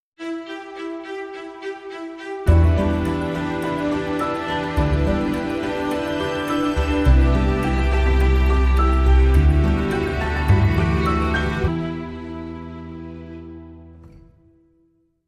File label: Broken Clock